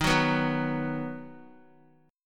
Eb Minor Sharp 5th, Guitar; Ebm#5 strummed; x,6,6,4,6,x; 4F,4A,5C,5G,5C,6E; JGuitar

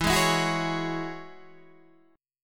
E 6th Add 9th E down Guitar DADGAD

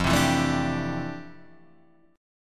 F Major 13th Guitar Half Step Down

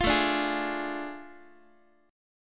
A Major 7th Suspended 4th Sharp 5th, down, Guitar; AM7sus4#5; strummed; JGuitar

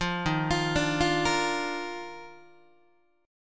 Eb Major 7th Suspended 2nd Eb down Guitar; arpeggiated; chord; JGuitar

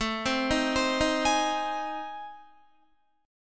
A Minor Major 7th Flat 5th, Guitar; arpeggiated; AmM7b5; JGuitar

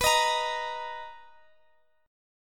B Major 7th B down Guitar Open D

